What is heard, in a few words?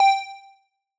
boop